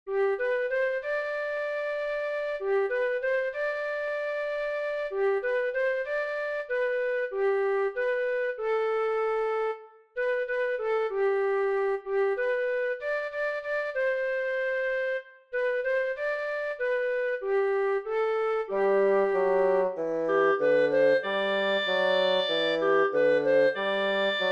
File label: When the Saints Go Marching In wind trio